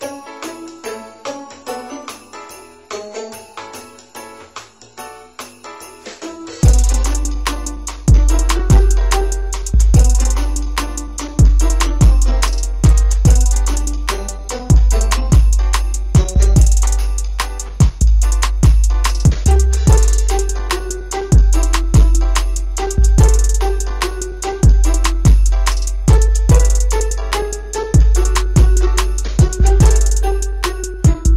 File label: music